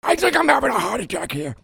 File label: PEOPLE